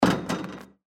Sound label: basketball brick clang shot